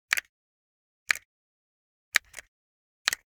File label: Generic Button Wood Tiny